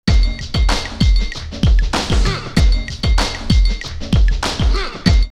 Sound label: Funk Machine 96, drumloops OldSchool; X-Static Goldmine